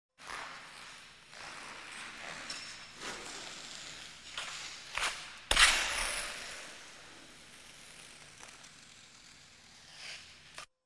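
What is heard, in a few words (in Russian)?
приближение и коньком по льду крупно; Звуки катания на коньках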